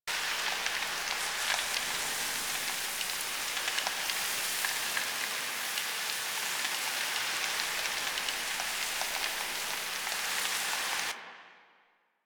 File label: Tree Rustle Loop; Warframe; Clan Dojo; Dojo Props; Dojo Dagath